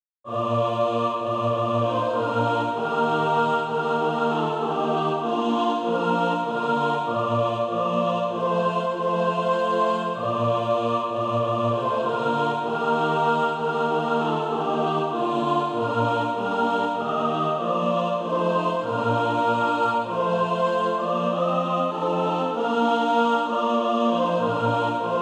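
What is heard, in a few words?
mixed, SATB Practise Music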